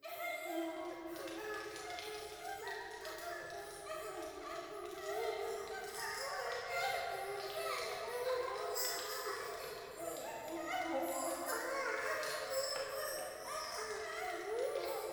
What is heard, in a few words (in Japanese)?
風呂 喘ぎ 暖炉